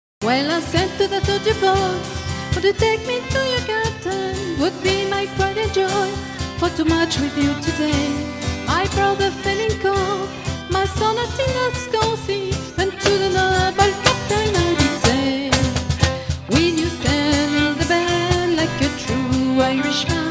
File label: songs